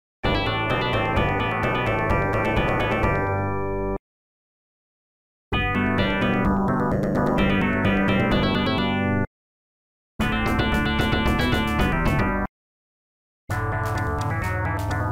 Jingles; Jumping Pop; Plump Pop hack; arcade

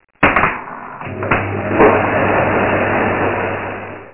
Vacuum; SimTower

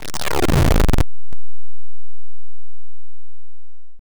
Cultist Walk (Sound); Gloom

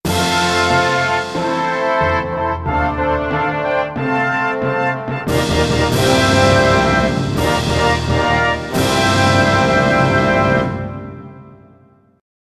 VS Battle Fanfare

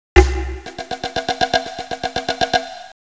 Drum Of Fire; eastern mind the lost souls of tong nou; windows gamerip 1995

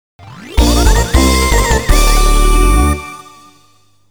Match Winner (WIN Match Jingle); bomberman online

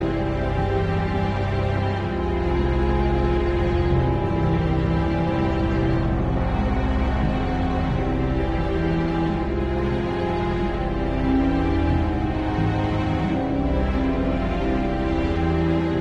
Tense Loop

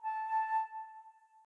Flute A1; Phoenotopia Awakening

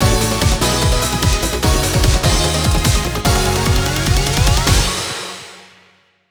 Mission accomplishment (Level Clear); Raiden III x MIKADO MANIAX

